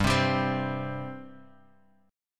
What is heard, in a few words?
C 5th G